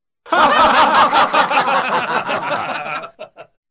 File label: herb laugh